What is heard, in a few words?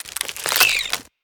Egg Shell Open; we are the dwarves gamerip 2016